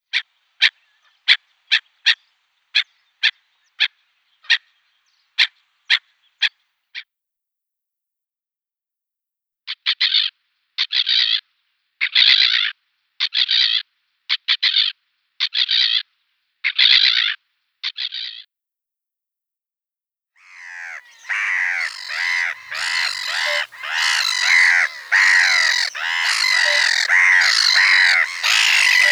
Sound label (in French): Mouette rieuse